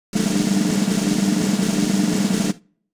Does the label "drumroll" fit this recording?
Yes